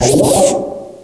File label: cry